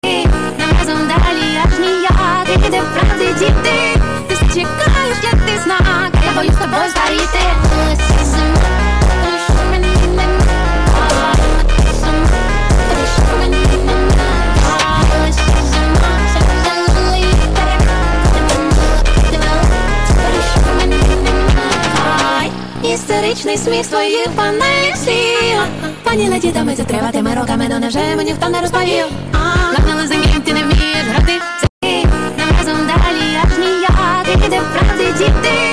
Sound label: hardstyle phonk remix